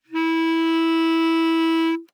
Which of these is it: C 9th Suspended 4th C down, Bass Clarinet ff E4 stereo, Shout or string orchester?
Bass Clarinet ff E4 stereo